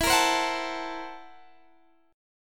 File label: Gb 7th Sharp 9th Flat 5th E, guitar